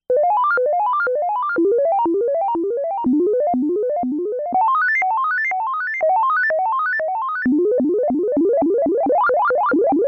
syncstair; Quad lfo

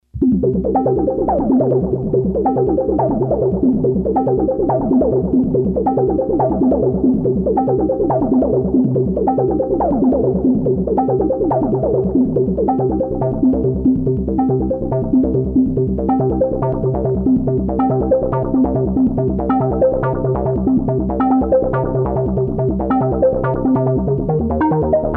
octaves; Quantizer

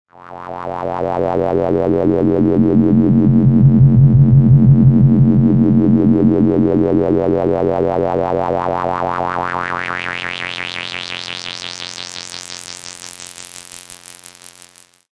bandpass mult

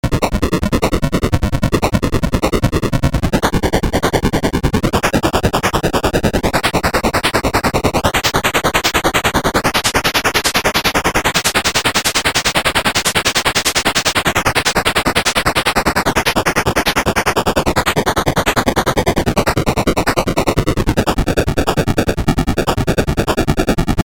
pitched noise

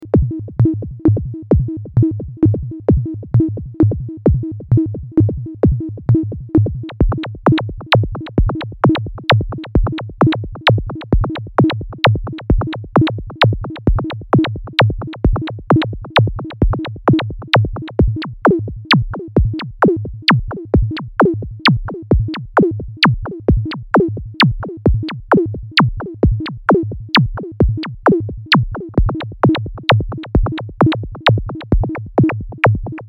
hardbeat LFO; Quad lfo

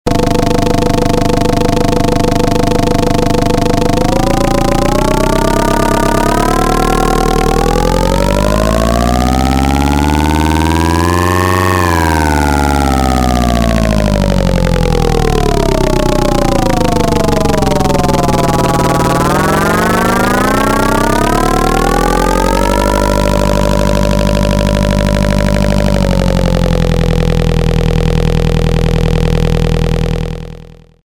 car trouble

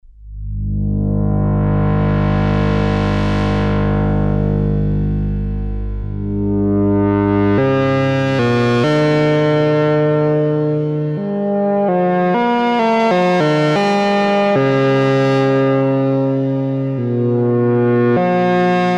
Quad LFO

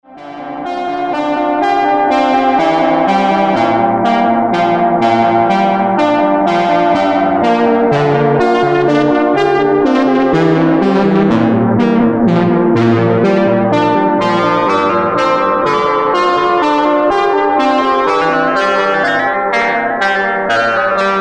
sax sync; TriVCO

